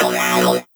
Tonal FX B

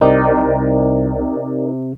rhodes D#, vocal house; PS-28 Vocal House Samples

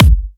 Bassdrum; Vengeance Samples

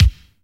Bassdrums Breakbeat; Breakbeat Kicks; Vengeance Samples

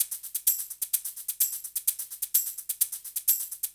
Percussive Loops 128BPM; Vengeance Samples

